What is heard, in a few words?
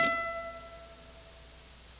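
guitar E4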